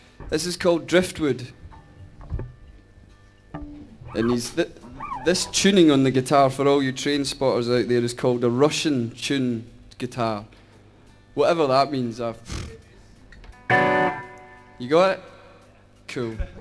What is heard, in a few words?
driftwood guitar